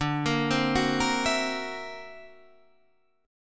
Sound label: D Augmented 9th Guitar Half Step Down, chord